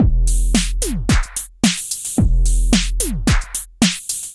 Kit groove 110 bpm; MIDI Clips; Moscow UHF; Ableton; Drum Essentials

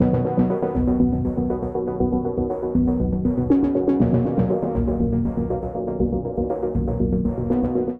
Live Key B Major 120 bpm; MIDI Clips; Tonal; Accompaniment; Drive and Glow; Ableton; Factory Packs

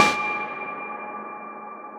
Snow Mallet; Tree Tone Presets; Press Keys; Ableton; Factory Packs; Inspired by Nature; Dillon Bastan

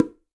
Bongo L Heel; Bongo A L Heel; Latin Percussion; Drums; Drum Hits; Ableton; Factory Packs

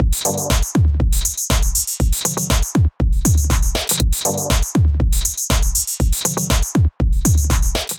Main Room Kit, Drums; Ableton; Punch and Tilt

